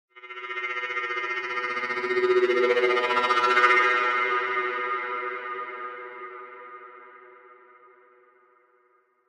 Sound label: Wash HF Elect Buzz